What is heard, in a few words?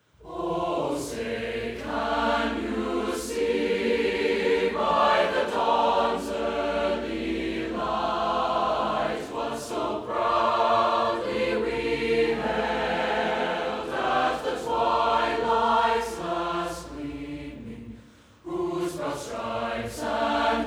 Choir Only SSB; All-State Choir w/o Piano Recording; National Anthem; MSHSAA MMEA